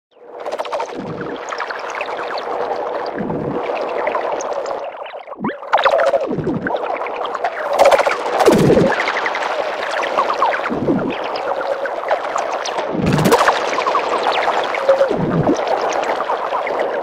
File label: Infected By Noise; Apparatus